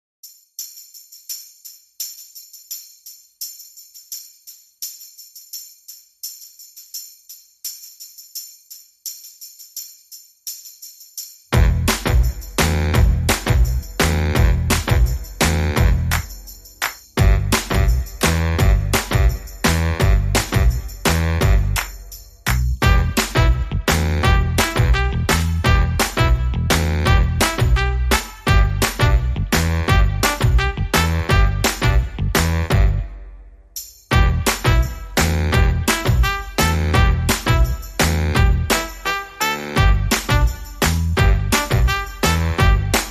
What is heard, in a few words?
Rockabilly Jive, No Guitars No Organ No Voices